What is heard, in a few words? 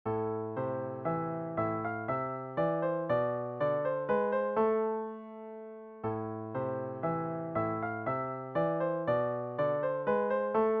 Prelude in a minor, piano